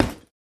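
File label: Boxing Bag Hit Short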